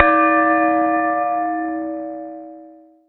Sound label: ring; tabernacle